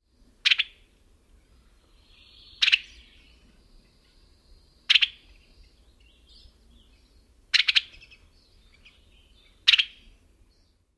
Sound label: calls